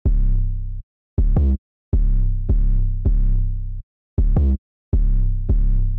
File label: Bass Dry